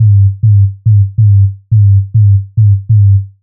140 F BASSLINE, Dubstep

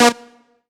Synth Shot A#; Mutekki Media; Vengeance Electroshock Vol.2

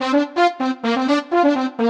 126 G MUSIC; funky; Loopmasters; Funky Underground Vol 2

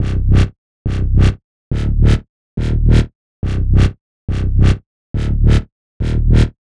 140 C BASSLINE, Dubstep; Loopmasters; The New Sound Of Dubstep; BASS LOOPS

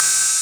Ride, Dubstep One-Shot Drums

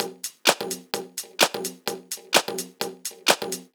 Loop, 128 BPM

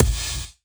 Kick

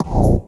Bb STAB; Loopmasters; Funky Underground Vol 2

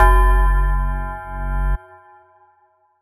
Bass Shot F#; Mutekki Media; Vengeance Electroshock Vol.2

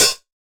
Hat, Dubstep One-Shot Drums

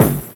Dirty Dutch Percussive; Mutekki Media; Vengeance Electroshock Vol.2; Dirty Dutch Special; Dirty Dutch Percussion

